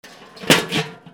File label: GARAGE